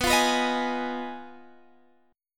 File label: F Minor 7th Flat 5th, B